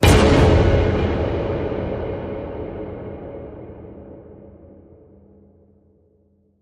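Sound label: Hit Ambient Burnished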